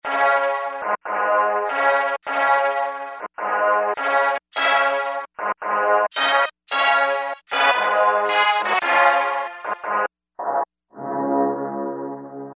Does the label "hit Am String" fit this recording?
Yes